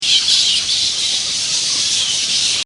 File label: Lots of bats; Animals; Bobby Cole